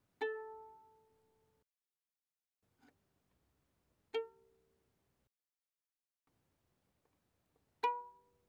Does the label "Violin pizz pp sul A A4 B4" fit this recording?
Yes